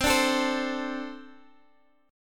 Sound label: C 6th Suspended 2nd C